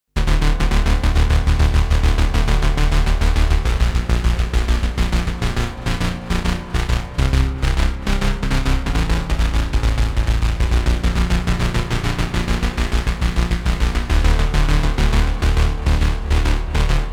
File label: NOISE BASS SEQ; TARDIS